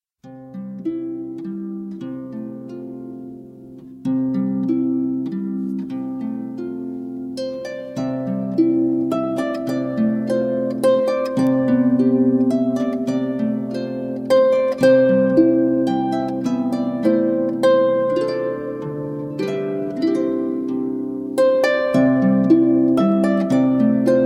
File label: Instrumental